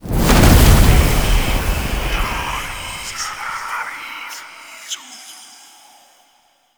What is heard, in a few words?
Firelight Activate; Warframe; Ambience; Entrati